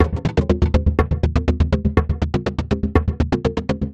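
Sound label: 122 BPM Sequence A major G minor Tonic D; innovative sequences 1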